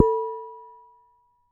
Damped Hit, Glass, Large Wine Glass

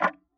Tick, Clocks Kitchen Clock

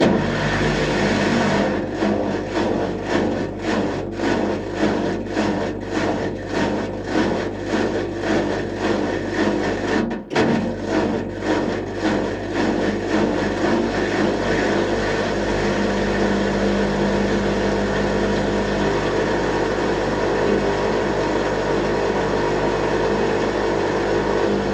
Thumping, washing machine; Wash Spin Cycle

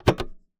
Key Noise, Melodica

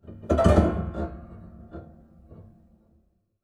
String Rub Erratic, Piano; Piano Miscellaneous

